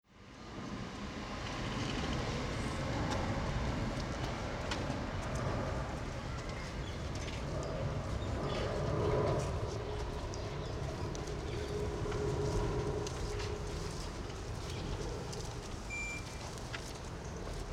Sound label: Sounds From The Bridge Above St Johns Station; london uk